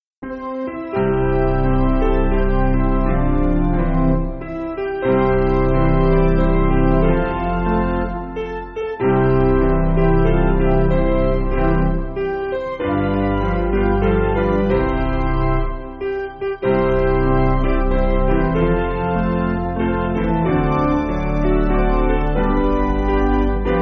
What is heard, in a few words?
Piano, Backing Files for Churches